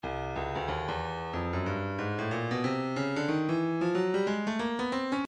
breakbeat 092 bpm; lexan b; Stylus Breakbeat Midi Files

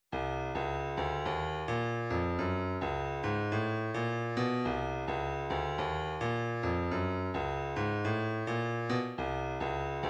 separated elements snare

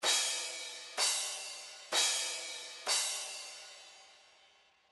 individual drums crash cymbal, midi

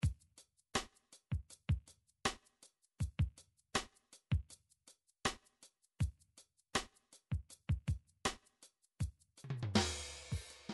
drum midi beat; Drum Midi Files Collection

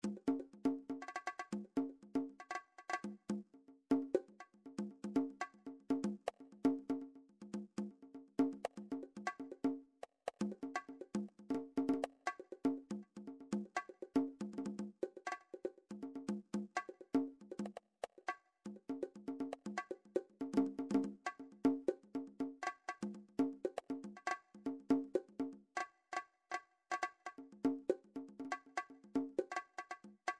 midi drums percussion; Midi Vol 4

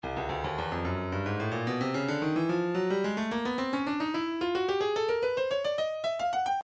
breakbeat midi 073 bpm; Blue Note D; Stylus Breakbeat Midi Files

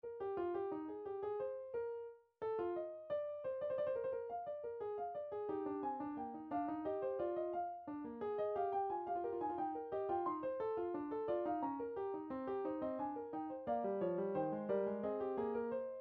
classical fugue, midi

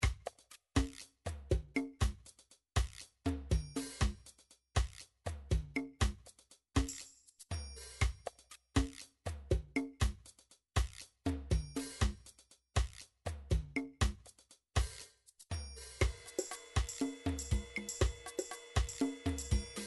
drum midi rhumba

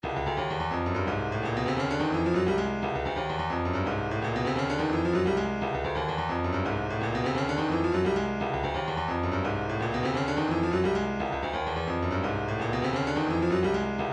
vocal planet shuffle fill, midi

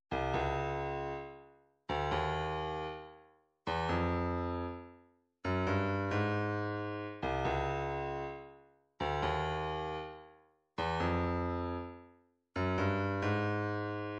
135 djembe

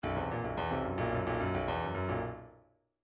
midi grooves funk

